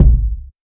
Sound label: small reverb steel kick drum sound g sharp key